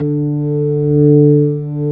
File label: ORG B3, organs; Sound & Vision; Gigapack I CD 2